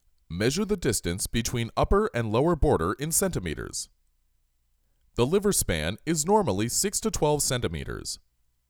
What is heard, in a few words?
Percussion; Ab Percussion